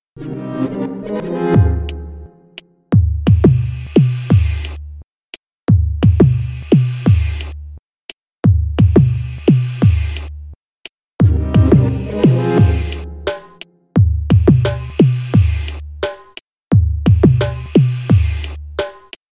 instrumental mix, electronic ringtones

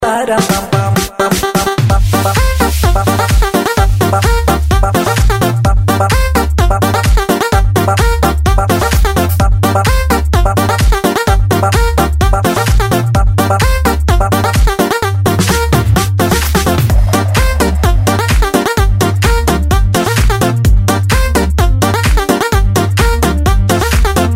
funky bass tone

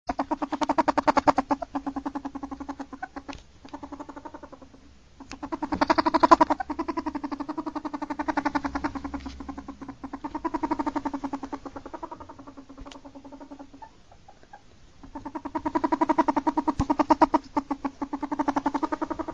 ferrets; animals

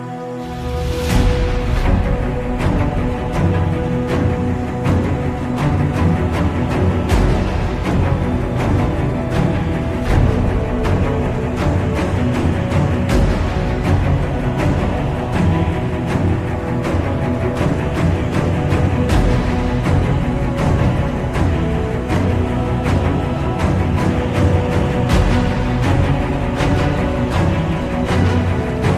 Epic Vibe

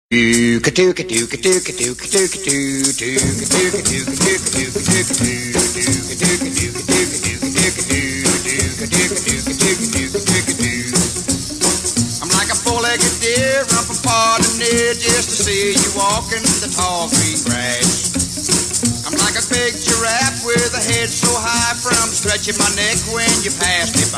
rockabilly

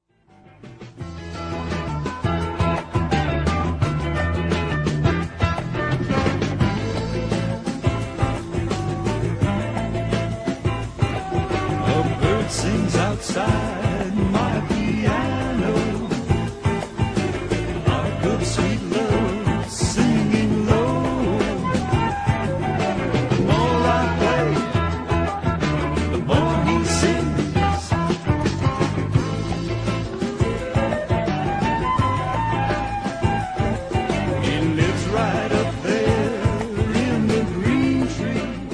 the piano bird

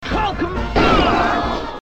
lion roar; animals

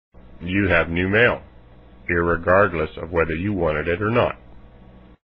mail tone; alternative

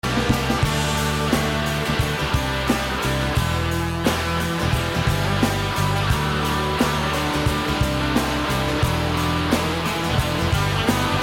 interlude instrumental

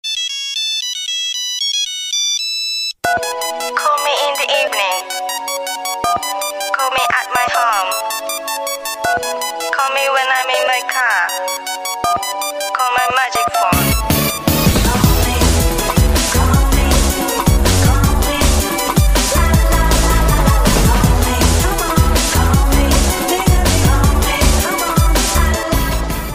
Gong

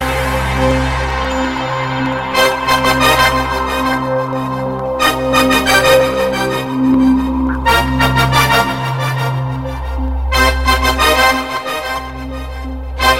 Disco Intro Ringtone

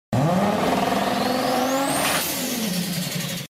Turbo Spool Tone

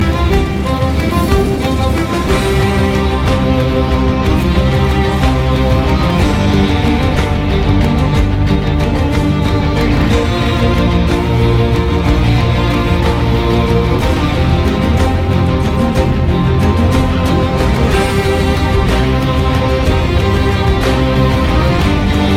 violin

